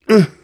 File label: launch pain; hl2 ep2; outland 12a; eli